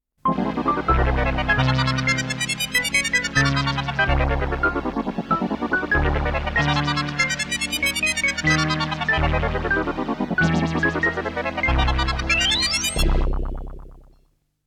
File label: humorous suspense